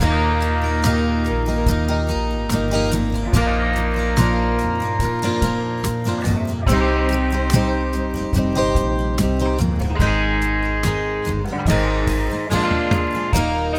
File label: Eb Warmup, brass; simplybrass